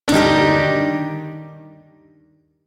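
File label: Shawzin Minor Chord; E; Warframe; Duviri Shawzin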